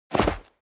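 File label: step dress boots sand jump, footsteps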